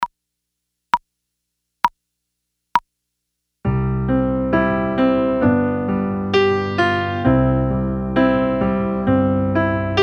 66bpm Backing Track

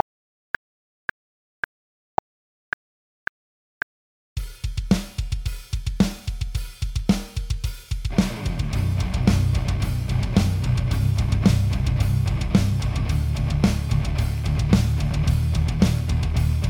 110BPM Backing Track

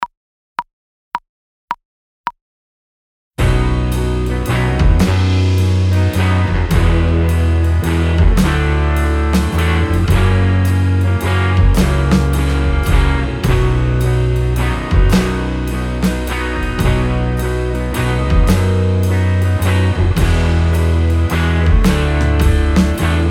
In the Pines 107bpm Backing Track

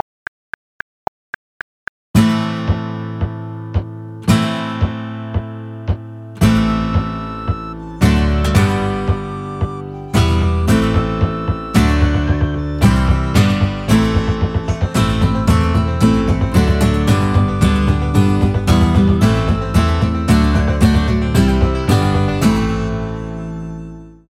224BPM Backing Track

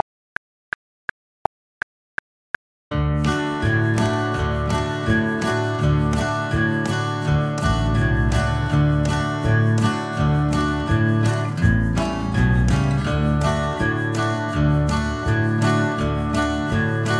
165 BPM Backing Track

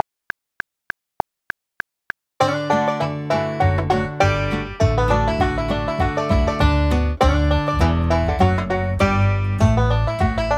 200 BPM Backing Track

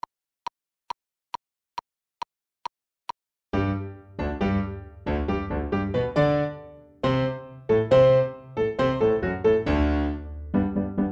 Eine Kleine Nachtmusik 137BPM Backing Track

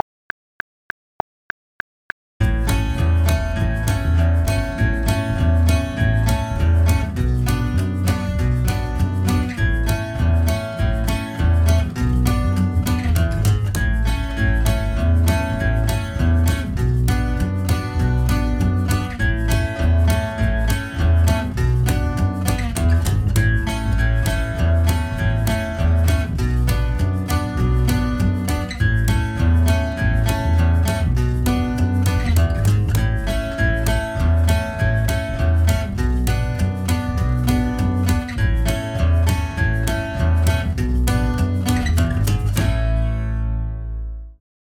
200BPM Backing Track

